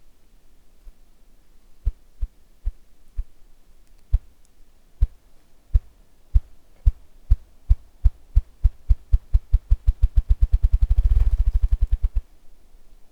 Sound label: drumming